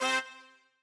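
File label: trumpet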